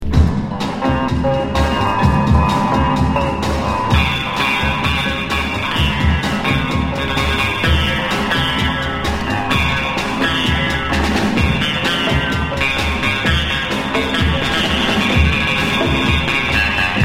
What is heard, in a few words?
karma sitar; Essential mix